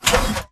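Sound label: Stalker Movement Servo Damaged Walk; Titanfall 2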